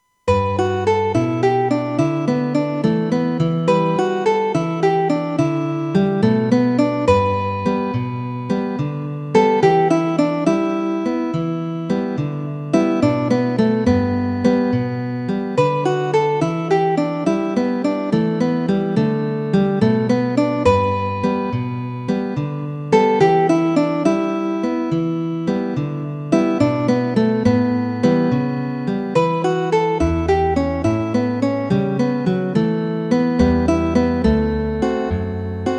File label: synth